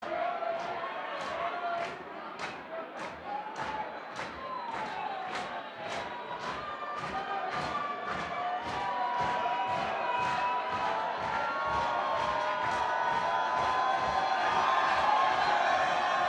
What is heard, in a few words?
Crowd Banter